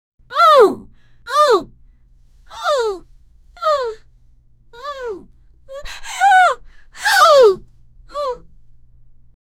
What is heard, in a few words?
women shocked